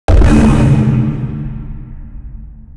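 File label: pile distant, construction; ambient; pile samples; Half Life Alyx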